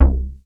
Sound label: DEEP C3, BASS; Q Up Arts; Dream Experience